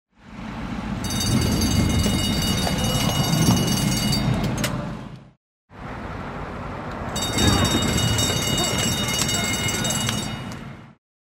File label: Horse racing, the bell is ringing